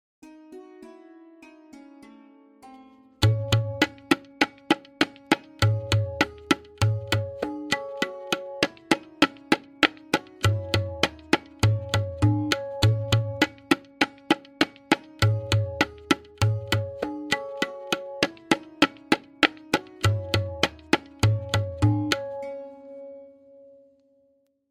Variation 2-Played with Lehra, Benares Kaida 1, Tintal; tabla